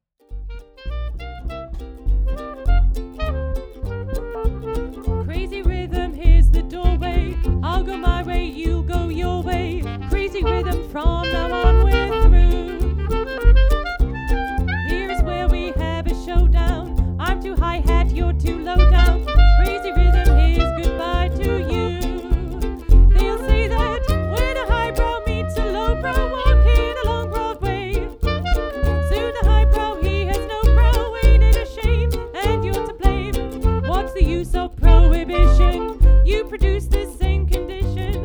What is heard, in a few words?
Live, 1920s jazz band; london